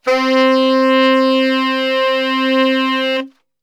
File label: C 2 SAX STR